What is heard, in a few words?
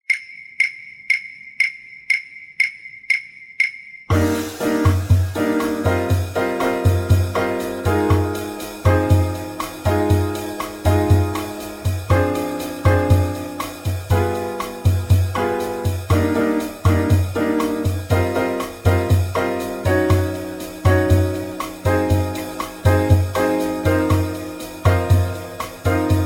Bass 120